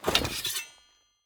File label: draw, combat weapons; CosmicRage